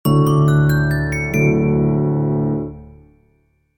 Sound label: jingle